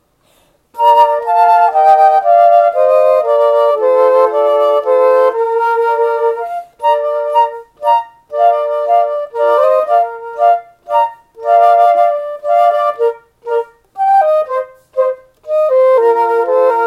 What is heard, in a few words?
trio w percussion german dance; soe festival ens